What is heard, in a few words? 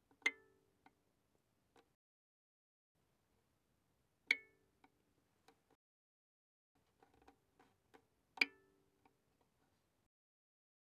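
Violin pizzicato pianissimo sul E C7 F7; Strings; violin2012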